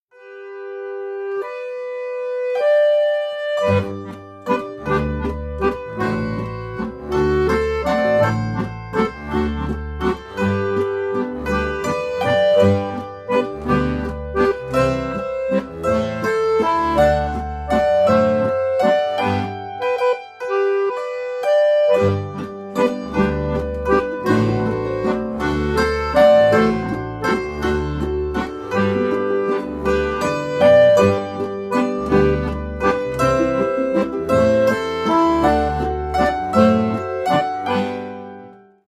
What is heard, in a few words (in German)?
Hackbrettmusik